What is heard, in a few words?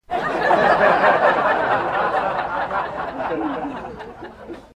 audience laughing